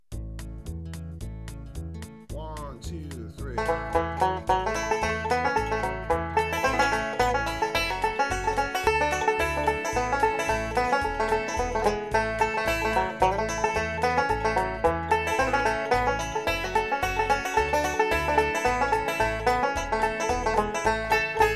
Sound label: cherokee shuff stereo, banjo; Shuffle